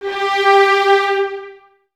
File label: Violins Detache, G 4; Miroslav Vitous; String Ensembles